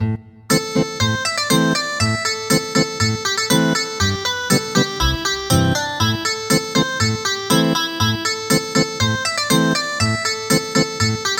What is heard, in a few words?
Ethnic Guitar